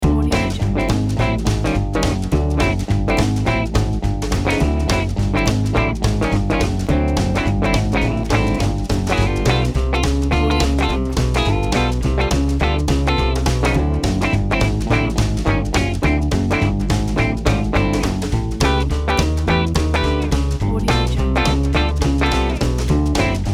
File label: classic 1950s logo pack